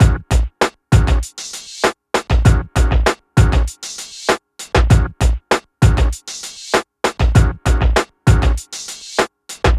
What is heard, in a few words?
HipHop Drums & Synth F# Min; Digidesign; Pro Tools Essential Loops